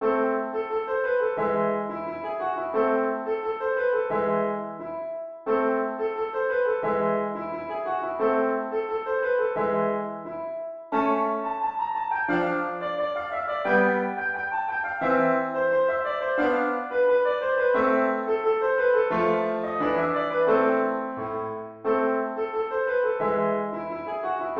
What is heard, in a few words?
piano